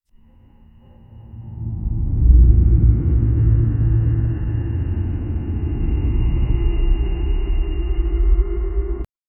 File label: a deep guttural creature, fleshy